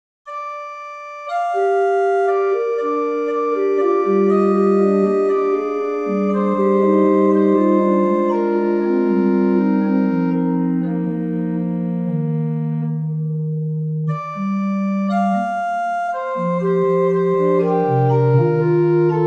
Coro organ

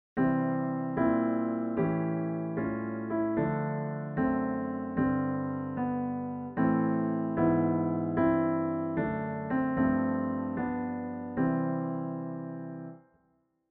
Steinway D classical recording stereo

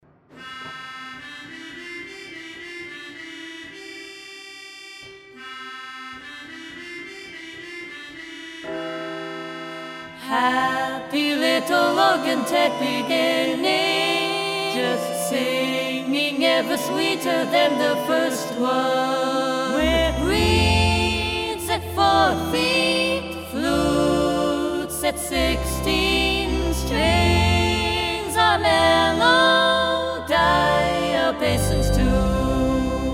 OrganTeq Reeds and Choir

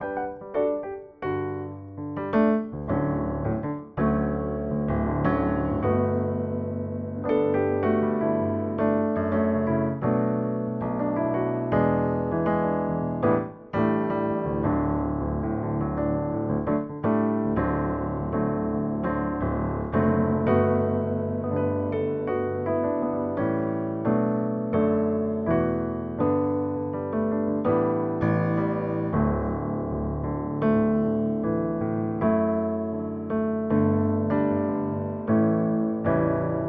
Kawai K-800

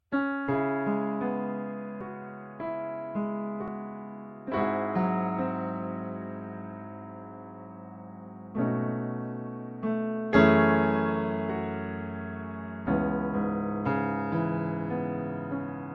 White Grand

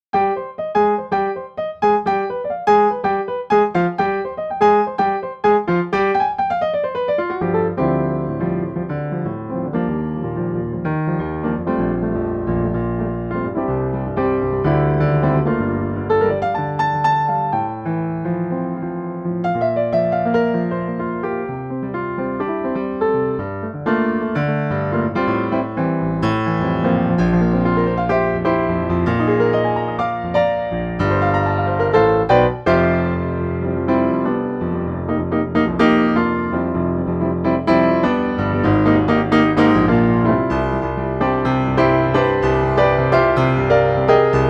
Jazz; less release

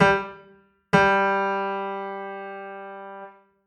Damper Noise, piano

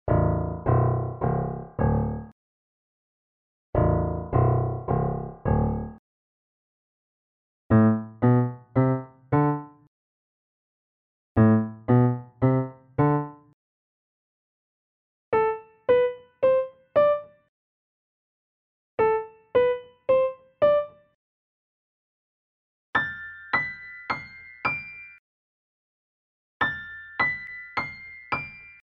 U87 v C414 Fig8

